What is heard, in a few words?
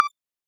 Percussive, UI SFX